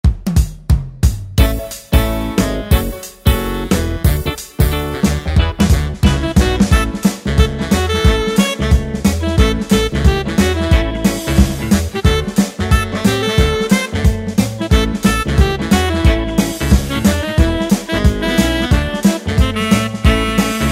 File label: 60s Vintage